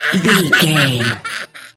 voice female laugh evil

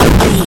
retro explosion big

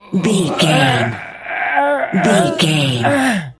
voice male effort push slow

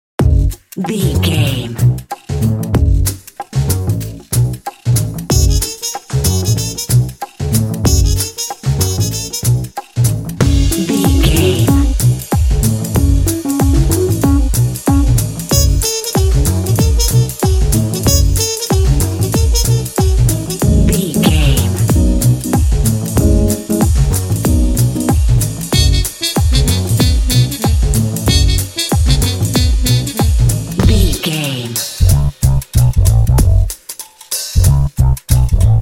swing

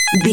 retro collect pickup item

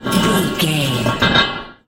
manhole metal slide door open close

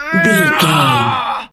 voice male death

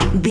footstep metal low run

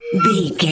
whistle slide straight tone short

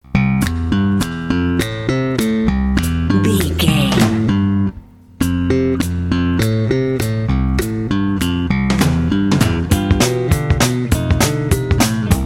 quirky happy snaps

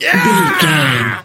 voice male death

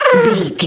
goblin fairy expression emote sigh

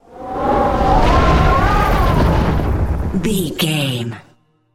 wind blizzard storm spell med

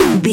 retro impact hit

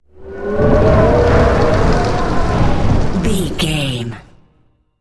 wind blizzard storm spell heavy

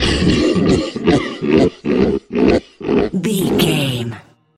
troll monster cry

